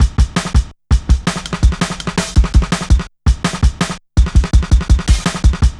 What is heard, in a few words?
Shuffling Live, Jungle Drum Loops